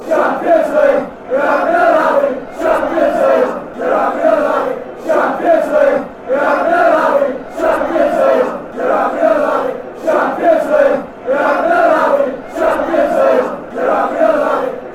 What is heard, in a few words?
champions league you're having a laugh, chants